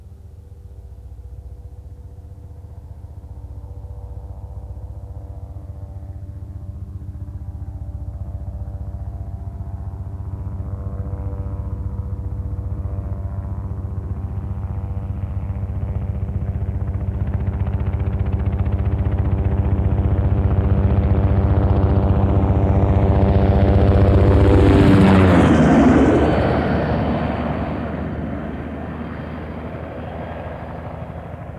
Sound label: Military Helicopter Fast By; Military Helicopter Fast By 01